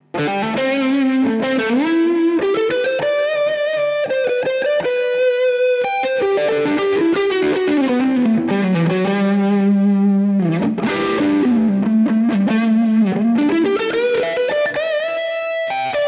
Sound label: Amber Overdrive low tone